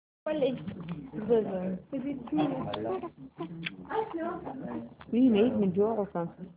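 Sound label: baby hungry, male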